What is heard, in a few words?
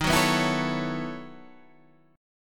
B 11th Eb, chord